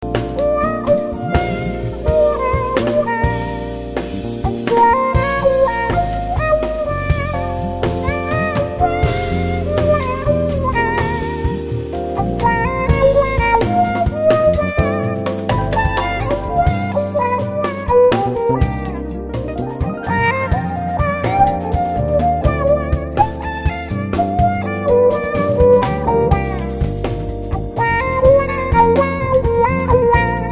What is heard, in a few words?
rhythm